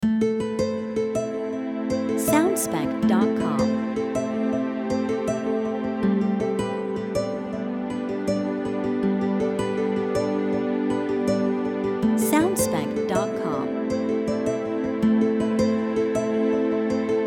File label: Neutral Relaxing Lite Loop